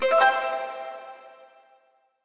Notification

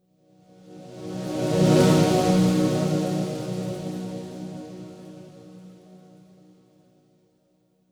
Ambient Transition

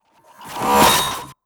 Aggressive Transition

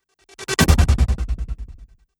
Strobe Transition

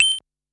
Game Sound

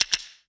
Notifications and Buttons

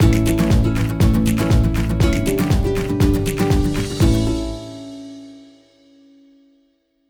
Corporate Transition

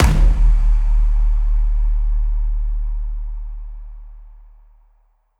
Impact

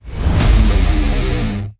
Rock Transition

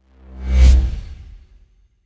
Simple Transition

